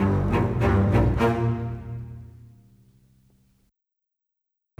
Bass Cello, Orchestral; Sonic Foundry (Sony Creative Software); Orchestral VOL-2; Modern 32

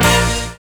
STAB; Univers Sons

